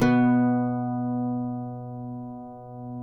cuatro; Spectrasonics; Hans Zimmer Guitars VOL-2

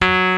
RHODES F#4; Vintage

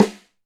Snare, Alesis DM5 Drum Machines

